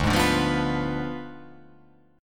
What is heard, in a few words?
D Diminished 7th D